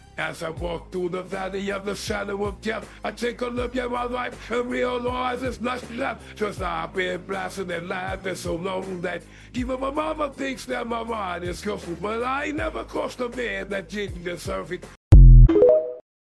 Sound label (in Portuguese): rap